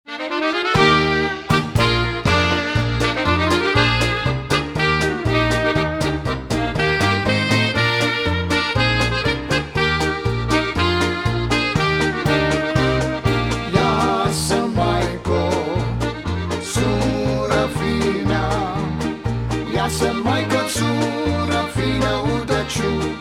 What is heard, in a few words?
Croatian Polka Medley